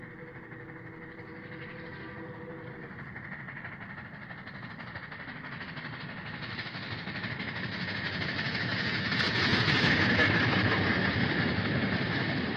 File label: whistle, Steam Sounds, Steel Rails